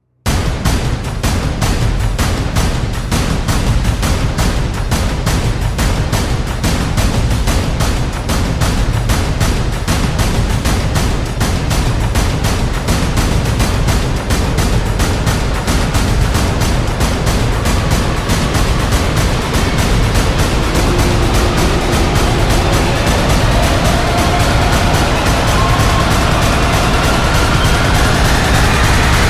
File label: Drums of Destruction